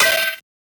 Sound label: snare metal; one shots; SEISMIC Drum Sample Pack; Slate